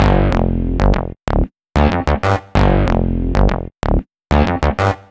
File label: Low Dirt, Bass; Sonic Foundry (Sony Creative Software); Synchro Funk